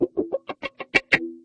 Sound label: build up the wah, Guitars